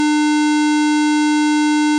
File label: Failure